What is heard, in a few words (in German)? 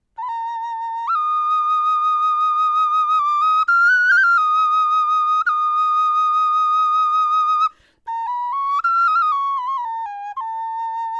melodie